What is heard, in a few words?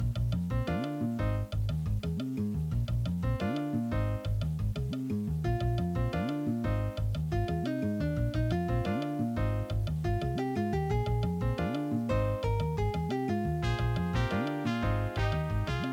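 level1, Ensoniq Sounscape Opus; soundcard test; Hocus Pocus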